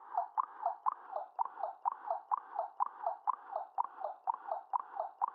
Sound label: baby fly loop